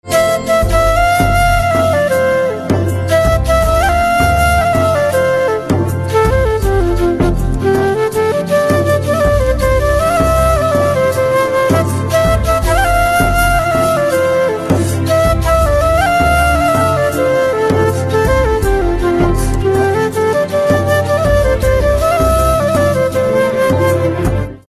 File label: Flute Instrumental Ringtone